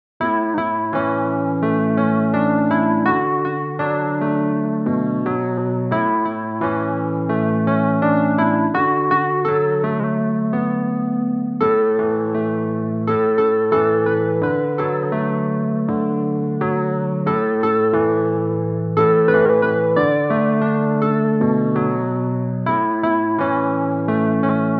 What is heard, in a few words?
Serene Distortion